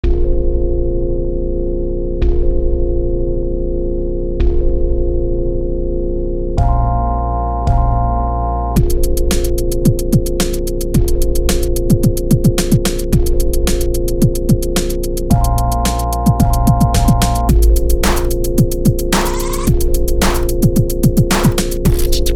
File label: 110bpm; corrugated